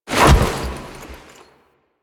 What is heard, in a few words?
Foley Melee Sweep; Warframe; Kahl